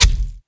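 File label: arrow tower hit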